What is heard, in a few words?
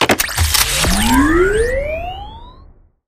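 free spins splash start spin; wild worlds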